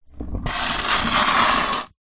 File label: skateboard scrape back on ground to slow then down